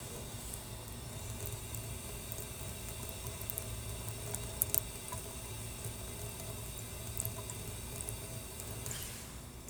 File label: water fountain